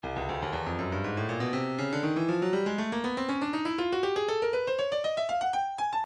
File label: stylus breakbeat midi files 120 bpm; Tailfeather D